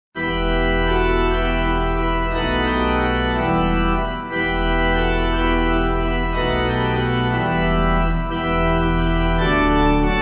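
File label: pipe organ hymn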